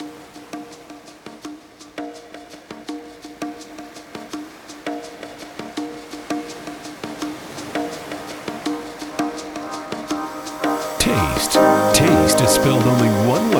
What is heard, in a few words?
chillout downtempo grooves electronica